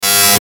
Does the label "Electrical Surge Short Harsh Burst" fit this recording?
Yes